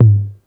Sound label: Belly Tom - G#; Cymatics; PHARAOH Beta Pack; Pharaoh Premium Drum Samples (BETA); Toms